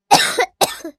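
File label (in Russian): резкий сухой кашель одиночный, ребенка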